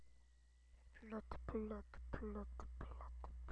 flute

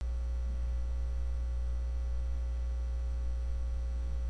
hiss

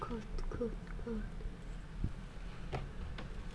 cough

